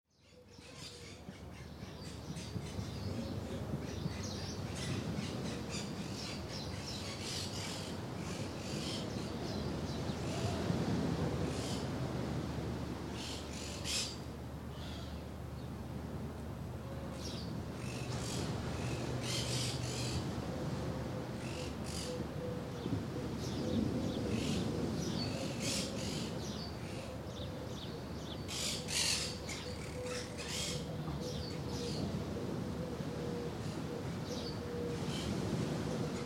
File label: Garden Of Exotic Birds, Tropical birds in woodlands near the seashore; Parrots and exotic birds chirping and squeaking, ocean w; Garden of Exotic Birds 2; Bird Sound Effects